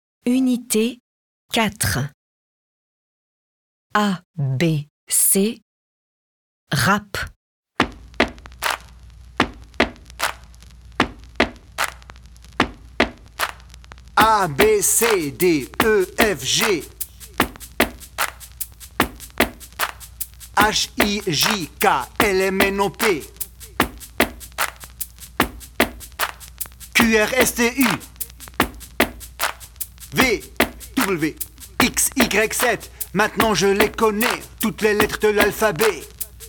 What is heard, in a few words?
abc rap playback